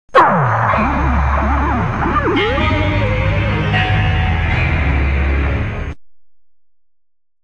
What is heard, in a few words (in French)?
jingle pub; w9